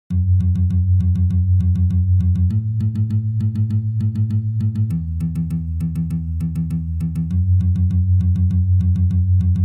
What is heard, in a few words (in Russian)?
Palm Myuting Galop